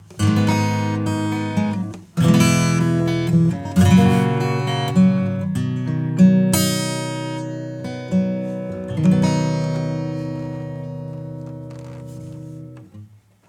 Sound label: E Gtr Clean; Neural Q